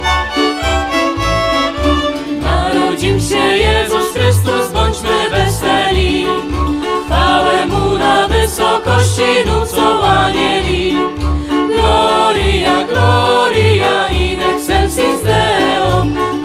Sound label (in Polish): Mloda Harfa